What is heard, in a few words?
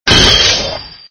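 SFX sound (scythe hit); Red Baron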